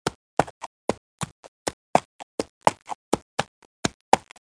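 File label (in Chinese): ma jiaosheng, horse neighing; jiachu jiaosheng; jiaqing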